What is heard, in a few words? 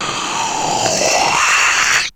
FX; AMG Megabass REMIX! VOL-2